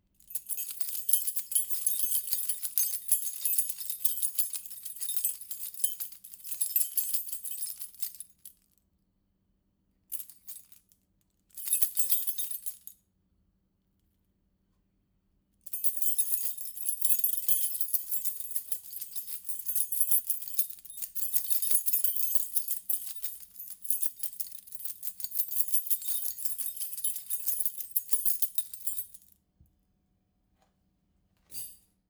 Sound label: KEYS; ORTF; 43db GAIN